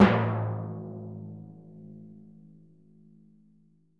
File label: TMP A#, Synclavier Percussion; ILIO; Synclavier Percussion 2; World & Orchestral